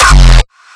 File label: Raw Kick, hardstyle; raw hardstyle kick pack 1